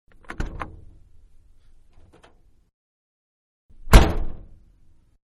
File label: Car Foreign-Trunk Open And Close; Vehicles; Cars